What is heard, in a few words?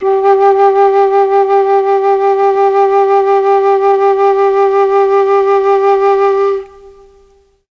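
G2 chamberlin flute, mellotron; CHMBLN FLUTE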